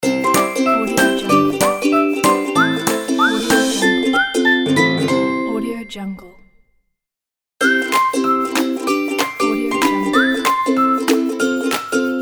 happy logo pack

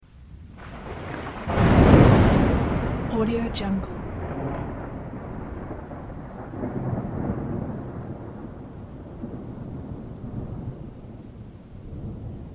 bolt of lighting strikes

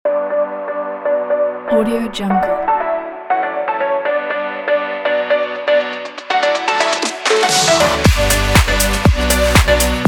progressive house party dance logo

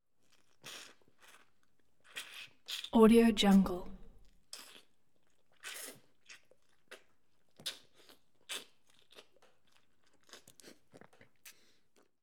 vampire sucking

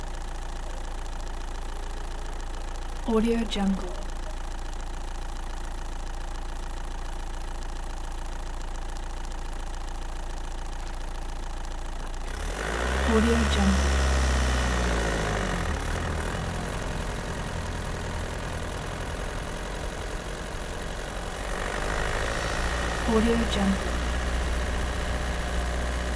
car driving engine

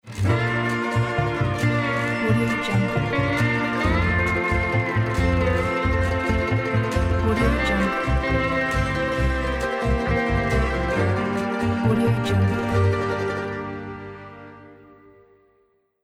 tropical slowdance in honolulu logo

